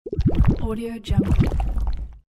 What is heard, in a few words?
jelly sound